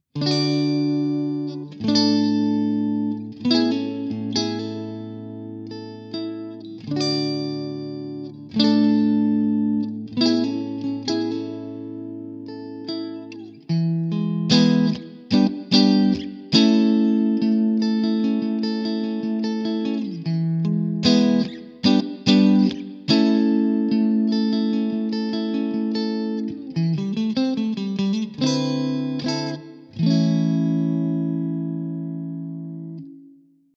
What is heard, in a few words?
clean; jensen tone